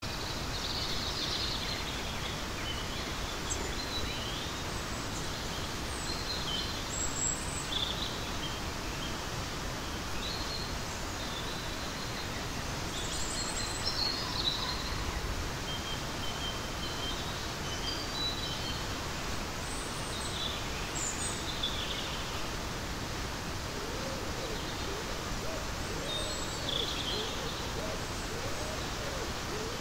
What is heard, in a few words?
Bird Song